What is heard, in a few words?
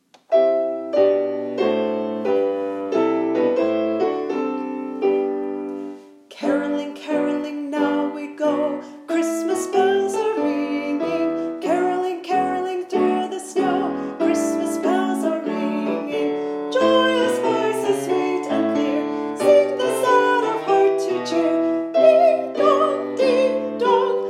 caroling caroling, childrens choir